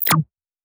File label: Glitch Insect